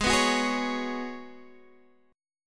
F sharp Suspended 2nd Suspended 4th G sharp

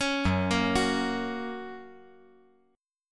Gb Major

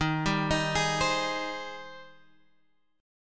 G sharp Major 7th

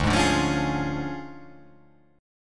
D Minor Major 7th Flat 5th, D

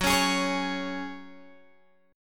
F sharp Suspended 2nd, Guitar, Open G; x,11,11,13,14,11; down

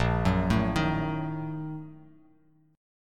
Bb-Major 7th Suspended 4th Sharp 5th; BbM7sus4#5 arpeggiated; JGuitar

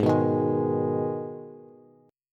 G sharp Minor G sharp down Bass, chord; G#m strummed; JGuitar

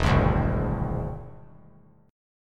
E Augmented 7th E down; E+7 strummed; JGuitar

